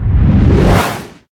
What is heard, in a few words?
controller whoosh; STALZONE; customnpcs; stalker